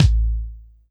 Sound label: Kick, Trap